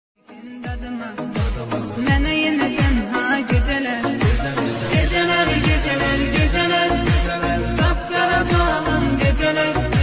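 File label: arabic ringtone arabic music arabian music background music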